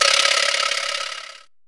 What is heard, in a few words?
Qijada, Roland S50 Percusson; Drum Samples